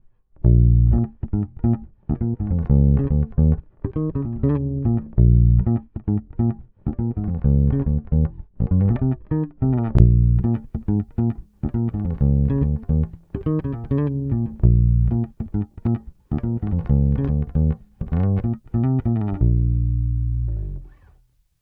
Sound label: Bypass Clean EQ